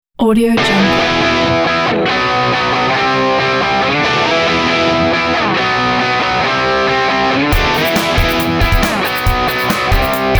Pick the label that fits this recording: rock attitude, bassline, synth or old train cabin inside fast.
rock attitude